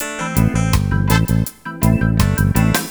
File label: reagge, ringtones; Google; NewWave Labs